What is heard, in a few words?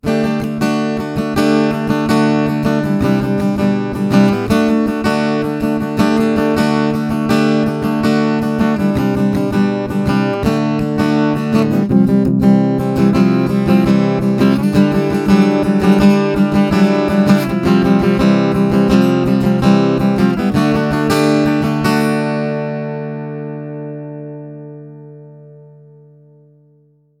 Piezo Strumming